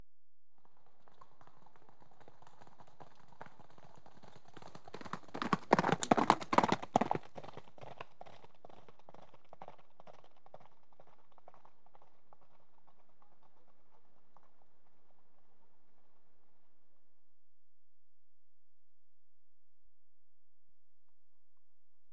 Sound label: Two horses gallop past on gravel; BBC Sound Effects Library